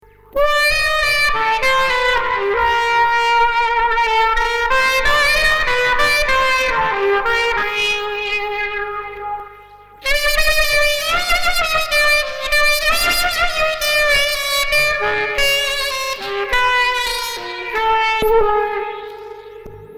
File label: sax